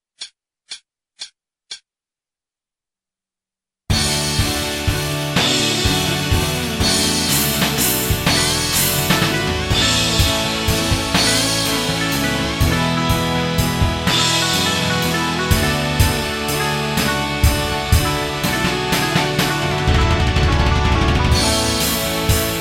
solo backing 62

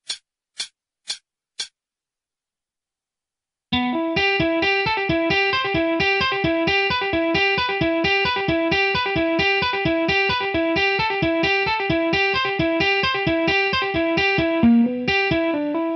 solo 66

